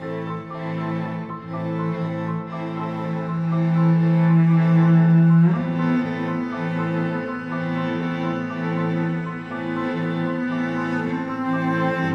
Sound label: The Photo Album, Classical Cinematic, Emotive; Narrative Mind